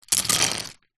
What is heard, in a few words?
office pens pencils handful set down table; zapsplat